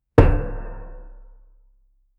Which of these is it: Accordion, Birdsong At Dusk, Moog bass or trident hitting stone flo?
trident hitting stone flo